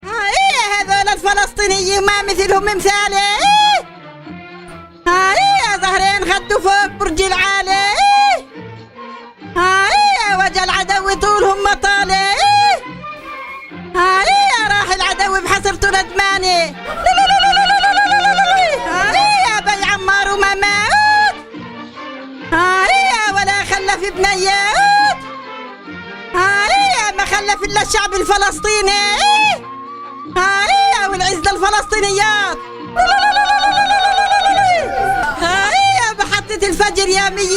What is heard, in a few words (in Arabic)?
مهاهاة نجاح توجيهي, زغاريد للنجاح اردنية; زغاريد للنجاح مكتوبة اردنية